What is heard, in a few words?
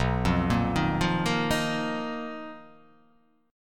B Major 7th Flat 5th B down, chord shape